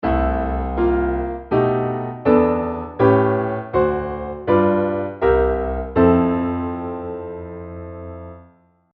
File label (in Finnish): kvintti; sovitus; dominantti